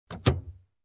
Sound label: Opening Car Door